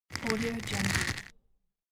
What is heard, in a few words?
squeeze